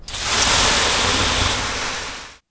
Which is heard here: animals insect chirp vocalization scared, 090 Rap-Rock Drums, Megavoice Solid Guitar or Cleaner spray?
Cleaner spray